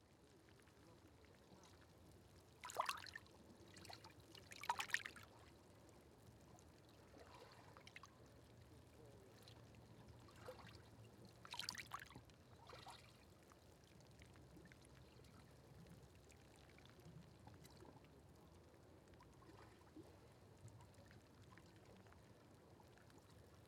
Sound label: VERY QUIET LAPPING WATER KELP HYDRATING; SONY D50; PBS; COBH; XY; Field Recordings